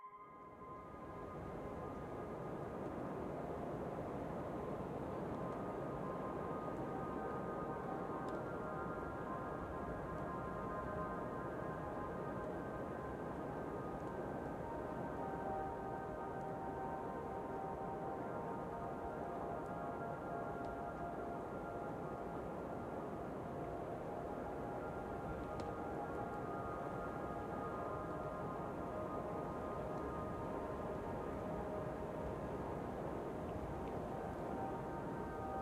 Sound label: Ambience Base; Ambience Fizzle Base